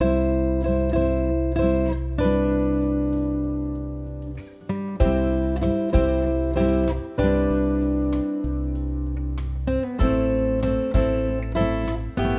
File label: Happy Gardening